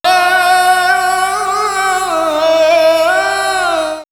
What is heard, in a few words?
Ethno Vox Islamic Prayer; vox mix; X-Static Goldmine